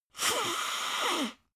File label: nose blow; soundpool